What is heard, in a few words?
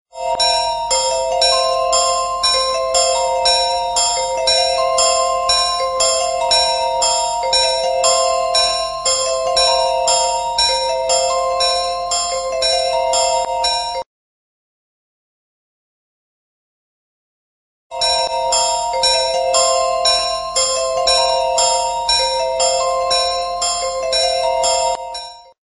alarm sound